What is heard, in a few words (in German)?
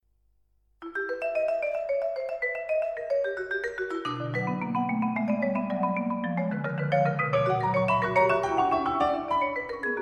Fuge e moll